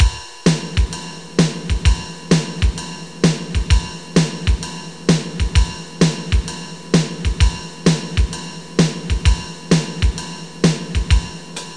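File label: Jazz, drum machine; Leonardo The Inventor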